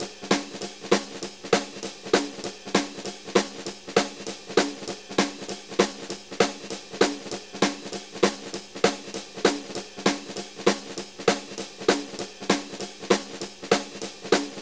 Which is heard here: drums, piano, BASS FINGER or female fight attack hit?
drums